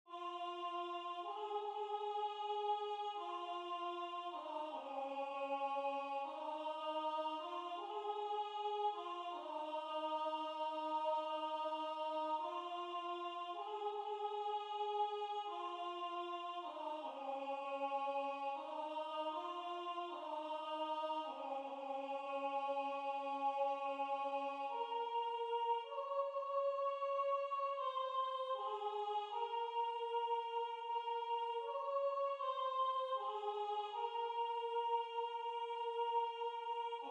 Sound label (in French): au nom des enfants, sopranos